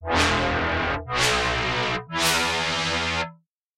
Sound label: FM altered; FM8预设中的一个音色; 让我们调整FM矩阵，改变振荡器之间的调制关系。